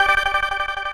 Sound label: synth